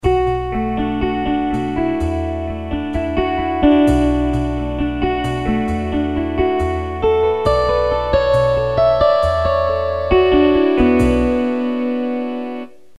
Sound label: Guitar